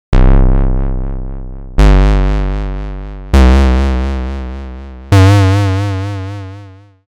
SINGLE OSC MOD Arturia Modular V3

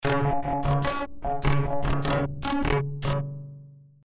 ASR-10 31 voices 30 kHz

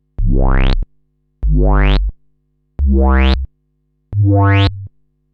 Reso Model 15; SIGNAL FLOW PART 2 EDIT

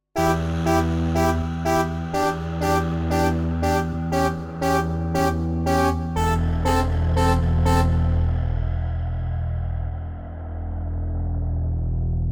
Loop Forward

